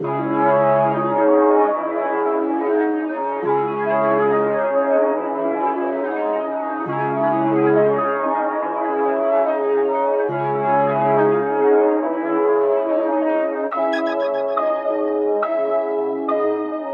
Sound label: 140 BPM C# Min, melody; Madness; Cymatics; 2022 Melody Collection Platinum Expansion